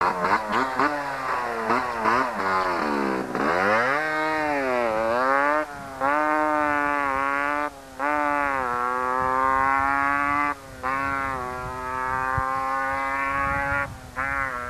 Yamaha RD 125 LC

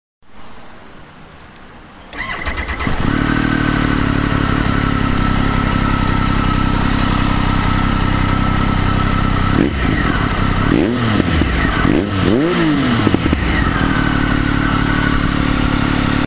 Triumph Sprint Triumph Carbon, motoskanas

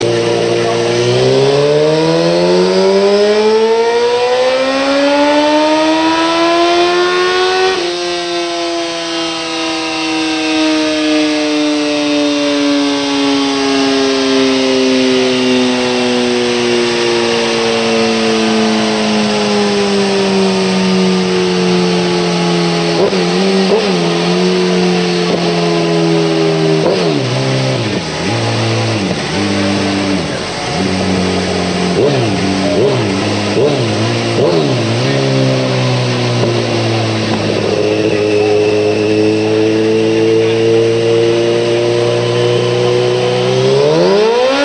Honda 900RR erion pipe, motoskanas

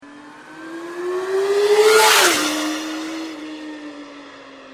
Yamaha R6, motoskanas

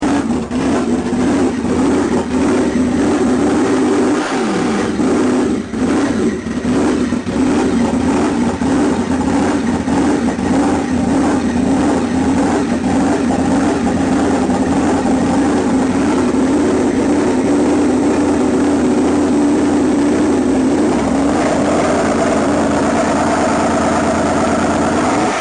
Yamaha VMax super charged, motoskanas

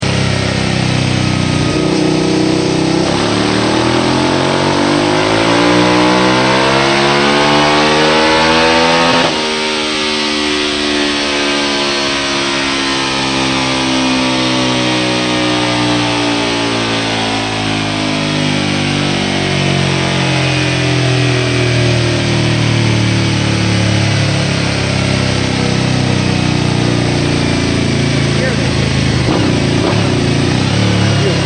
Suzuki TL1000S cans; 1999; yosh

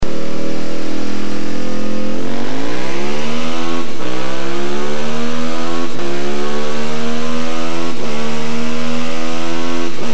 Aprilia RSV Mille R; 2000; akrapovic pipe

